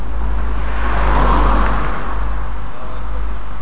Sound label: passat, driving; akustik